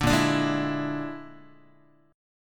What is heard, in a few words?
Bb 7th Flat 5th Bb, Guitar; Bb7b5; strummed; JGuitar